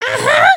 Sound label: excited, emotes